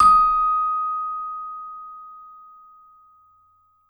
VIBE MED FF D#5; Marimba & Vibes; Sampleheads; Dave Samuels

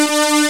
Level Opening loop; SNES; Corn Buster

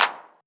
Old Skool House Clap

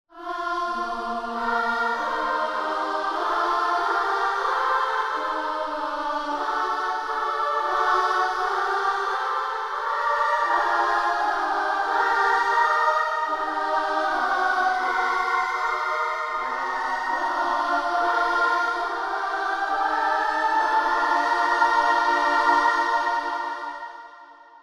Kids Choir, Gospel; ILIO - Vocal Planet VOL-6; Childrens Choir Multisample

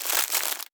Foley Bag; Kagi; Foley Ritz; M8; percussion and foley

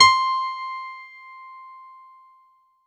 C5 DANCE, ANALOG SEQ; Roland JV 2080

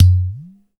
POT DRUM, UDU

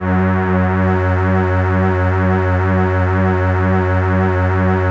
SYN, ENSEMBLE; Best Service ProSamples vol.55 - Retro Sampler; AKAI

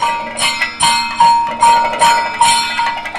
KATAK, Indian Traditions

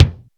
resonant k, Jazz Brush

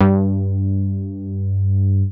MOOG BASS G2; Best Service Dream Experience

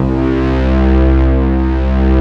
BIG MOOG; USB Soundscan vol.49 - Analog Fantasies; AKAI

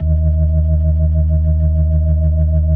EB1F, B3

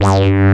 MOOG LEAD; Moog Synths; E-MU Formula 4000 Series Vol. 3; Analog Odyssey

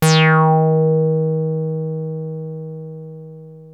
303 D#3, TB 303; Hollywood Edge; Giorgio Moroder Rare Synthesizer Collection

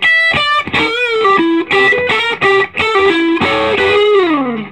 SOLO E, Vintage Blues Guitar; AKAI; USB Soundscan vol.22

